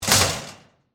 Gate Close Locker, Industry, Gate, Close, Tool, Locker; Gates; Doors